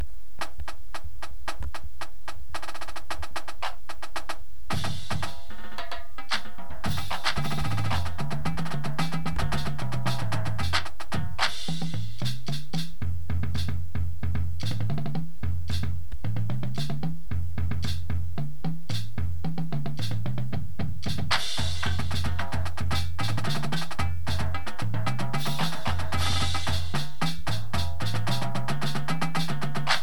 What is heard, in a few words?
full drumline